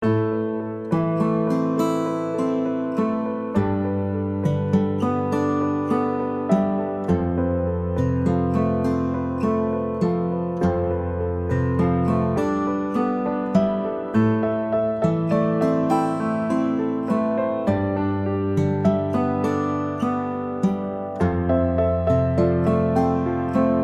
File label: story conversation; beasts